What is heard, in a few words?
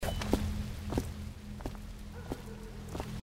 walk roof; dishonored